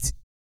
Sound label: ELASTC TUBA, Vocal Blues Soul